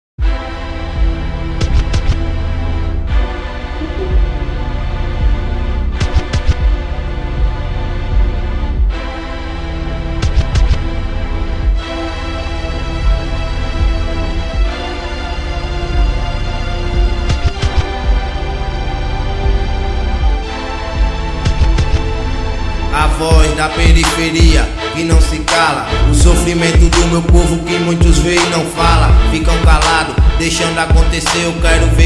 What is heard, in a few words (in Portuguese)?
rap sergipano